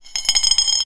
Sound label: stone magic grow